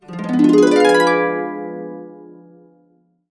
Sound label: D dim7 up, harps; USB Soundscan vol.62; Complete Harps; AKAI